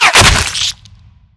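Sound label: physical bullet impact flesh, violence; Benny Placeholders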